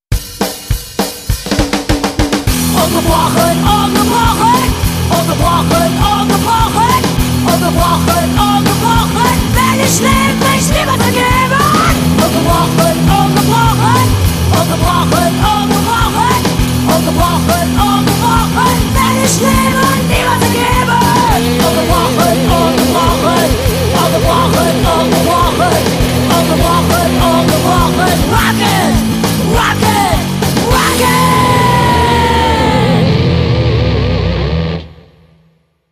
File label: jingle